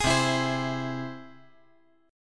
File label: C Augmented down